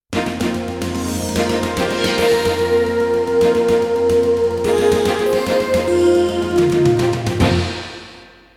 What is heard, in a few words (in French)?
Flash Info link; Jingles medley